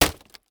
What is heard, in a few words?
Impact Board Wood Hit By Solid Metal Pole